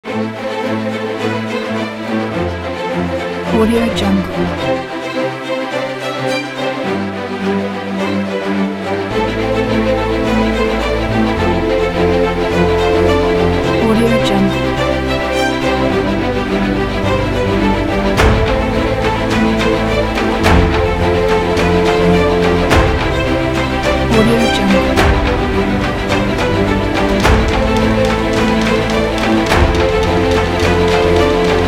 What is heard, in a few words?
epic strings inspirational